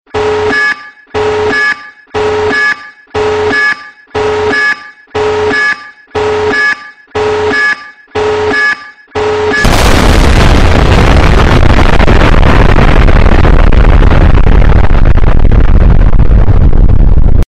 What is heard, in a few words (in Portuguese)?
alerta explosao